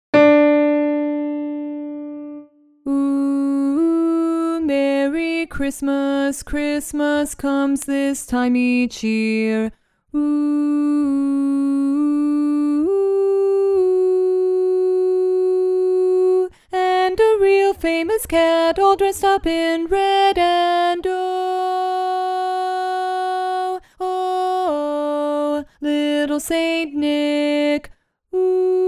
Bari Alone; holiday music

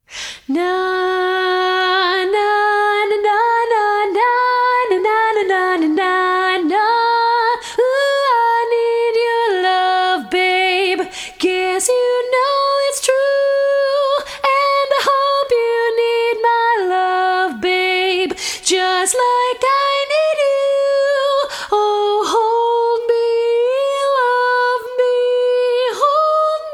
Tenor Alone

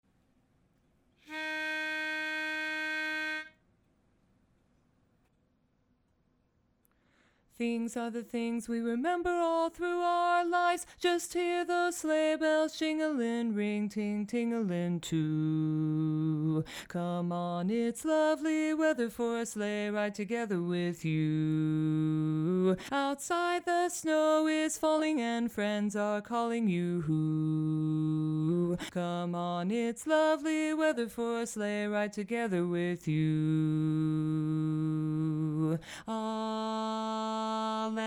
Bass Alone; holiday music

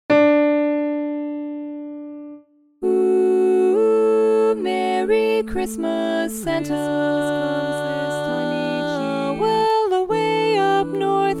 Lead Dominant; holiday music

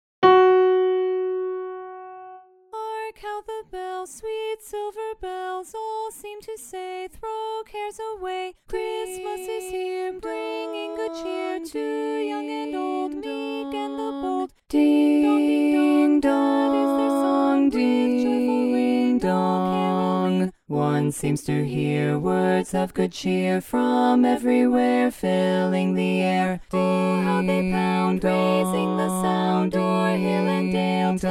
Carol of the Bells Bass Dominant; holiday music

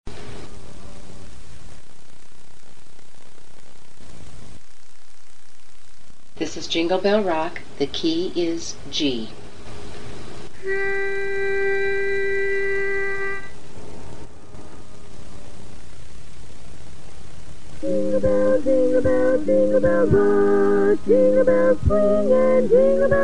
tenor; holiday music

